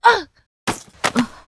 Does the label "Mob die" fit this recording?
Yes